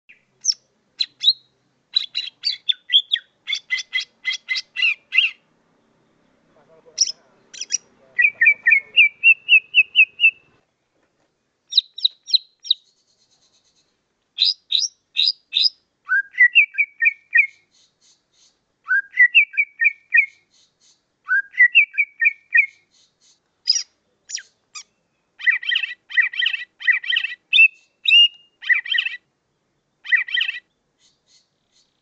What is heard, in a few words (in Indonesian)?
masteran ijo mini